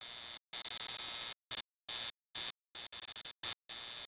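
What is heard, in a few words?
electric buzz; Star Wars Knights Of The Old Republic II; XBOX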